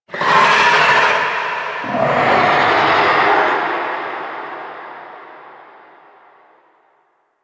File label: Infested Juggernaut Spawn Warning Vocal; Warframe